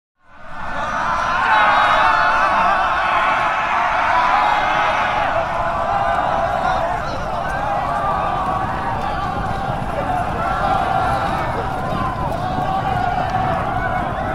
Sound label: Infantry Charge 1000 Men; Historical; Hollywood Edge Historical Series; War Horses Armies & Weapons